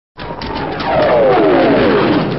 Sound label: rocket notification tone